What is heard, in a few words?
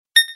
Beep